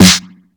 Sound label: small reverb snare sample f sharp key